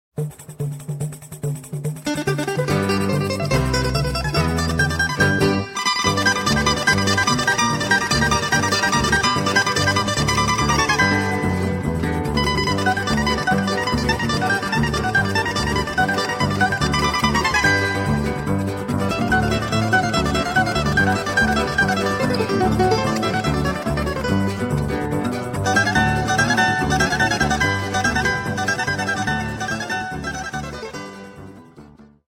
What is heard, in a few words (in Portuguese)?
cavaquinho; instrumentos